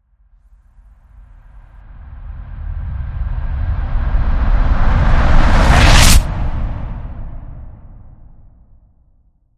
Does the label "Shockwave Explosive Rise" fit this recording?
Yes